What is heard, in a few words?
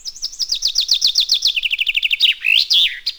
chaffin; WAR